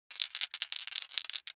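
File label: big three rattle in hand, wuerfel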